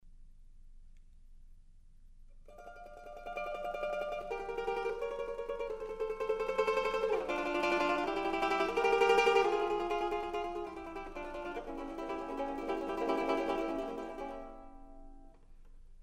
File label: Canto d Estate, mandolin